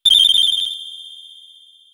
item syrup; SNES; SMRPG

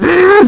angry; GBA; MLSS; Cackletta

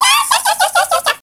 laugh; DS; ML BOWSERS INSIDE; Fawful

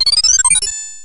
ring; N64; YOSHIS ISLAND

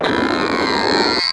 Creaking Noise; PC; MARIOTEACHESTYPING2

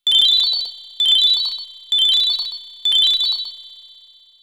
enemy boss coins; SNES; SMRPG

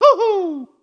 hoohoo; N64; DRMARIO64

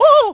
"Wuuuh"; DS; NEWSMB

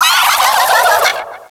laugh; DS; ML BOWSERS INSIDE; Fawful

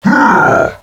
huuurgh; DS; ML BOWSERS INSIDE

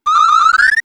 transform; geno; SNES; SMRPG

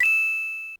coin; SNES; SMK

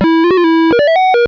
level complete; ATARI2600; DONKEY KONG

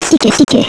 seekiseeki; GBA; MLSS; E.Gadd

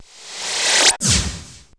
Futuristic Sound; PC; MARIOTEACHESTYPING2

